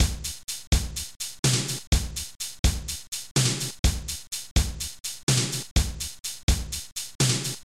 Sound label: beat